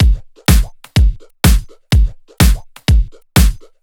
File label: No Hats, Drum Loops, 125 BPM; Sonic Foundry (Sony Creative Software); Underground UK House & Electro